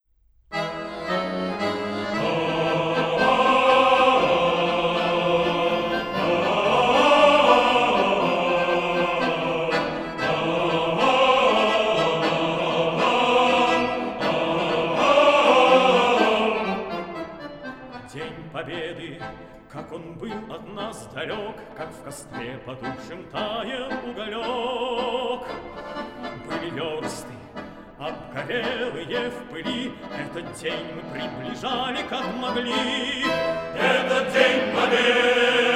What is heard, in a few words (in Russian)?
Хор Валаамского монастыря